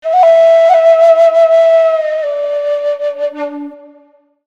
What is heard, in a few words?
whistle mordent d